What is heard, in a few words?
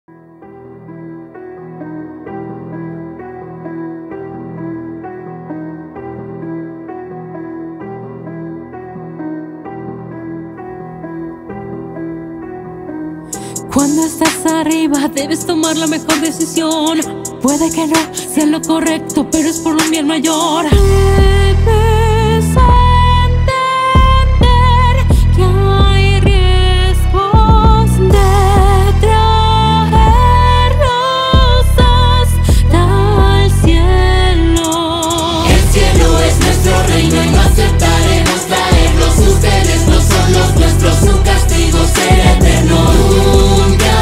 rap; hazbin hotel